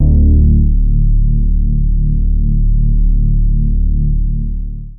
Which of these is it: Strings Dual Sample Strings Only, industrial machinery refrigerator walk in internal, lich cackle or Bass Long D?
Bass Long D